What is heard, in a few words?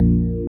ORGAN A